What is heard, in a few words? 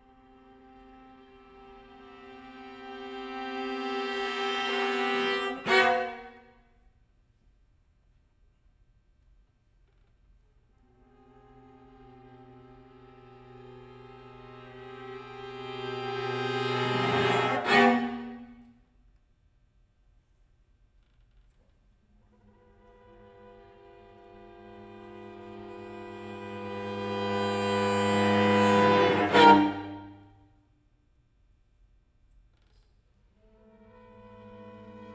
string quartet